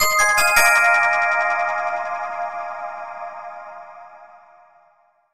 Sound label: Important Information Jingle; Namco Museum Essentials; PS3